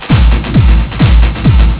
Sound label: tekkno eq reverb